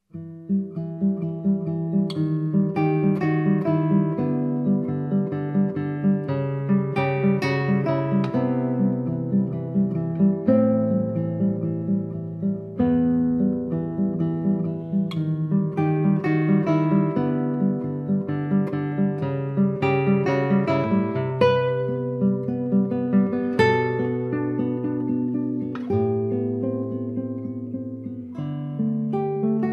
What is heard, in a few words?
Studio No. 7 In E Minor, Classical Guitar